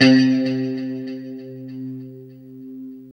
LO-E C 3, Guitars; Spectrasonics; Hans Zimmer Guitars VOL-1; JC-SHEEN

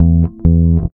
REAL BASS, BASSLICKS; Ueberschall; Jam Box

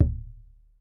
PUEBLO DR, American Natives; E-MU Formula 4000 Series Vol. 4; Earth Tones

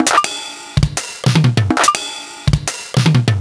Lel PSR

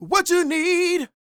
SING, Vocal Planet R&B & Dance; ILIO

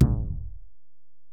Tom, Alesis DM-PRO

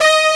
Stadium Organ; SNES; Brett Hull Hockey

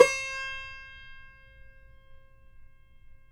CUMBUS C, Guitars; Spectrasonics; Hans Zimmer Guitars VOL-2

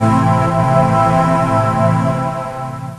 Pads

